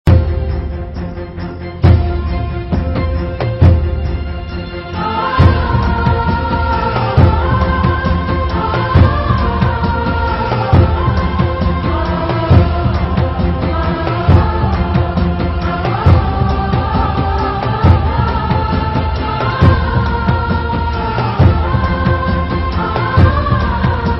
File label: Humming Tune; Tv Serials; Sony